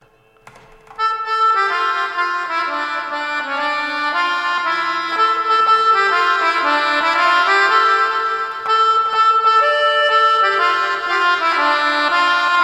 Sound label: pianica; Ancom music